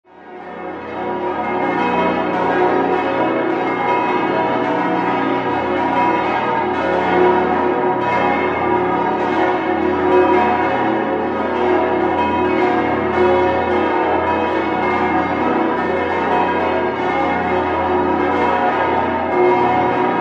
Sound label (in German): Nuernberg Friedenskirche, Glocken